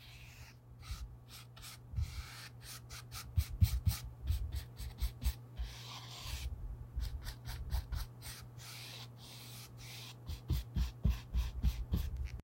Dry painting

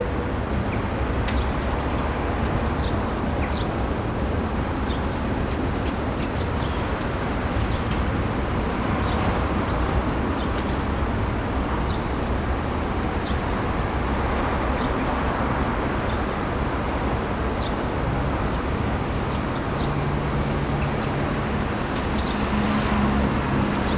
City Winter Atmosphere

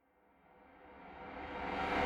Reverse Fx

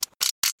Metal click

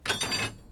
Metal Handling Bars

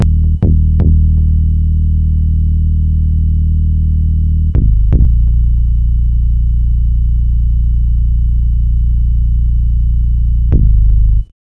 Bass

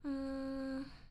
Girl Thinking

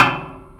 Steel Bench Hits

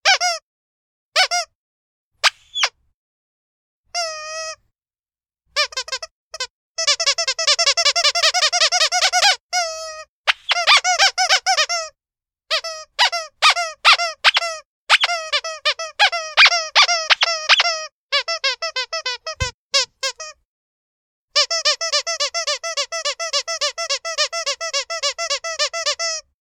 Squeaky Toy Sounds

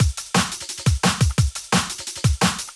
Breakbeat 174bpm

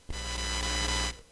Machine

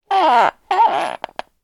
Squeezy Squeaker

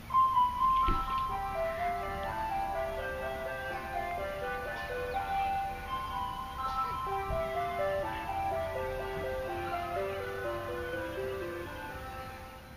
Ice Cream Truck Melody

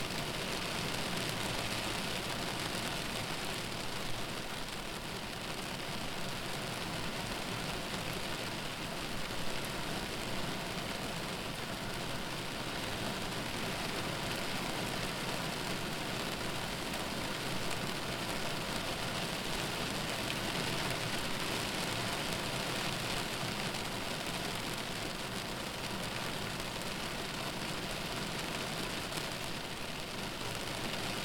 Soft Rain On Car Windshield

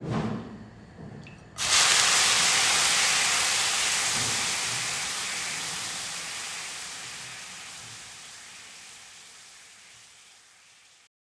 Food Sizzling

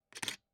Single Photo

